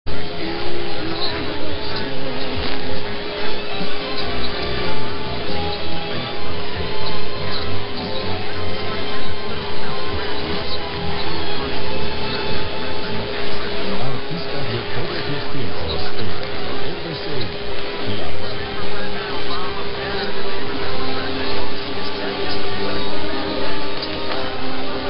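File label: Colombia, radio; HJAJ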